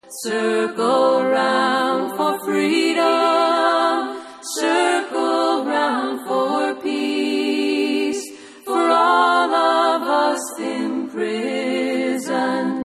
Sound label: circle chant